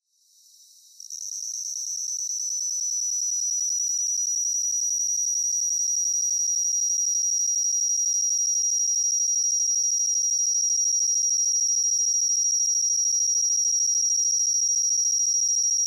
kumasuzumushi

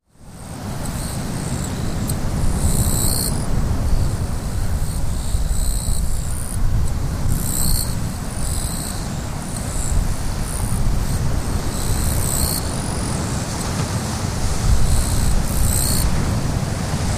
kirigirisu; ヒガシキリギリス

